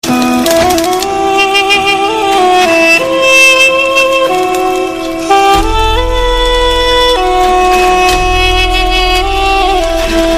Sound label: narnia flute; ringtones; rock